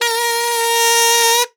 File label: KAZOF H4, KAZOO; Xsample VOL-9